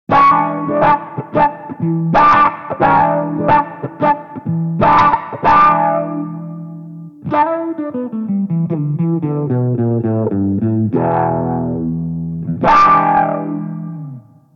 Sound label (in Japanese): Clean SENS 11時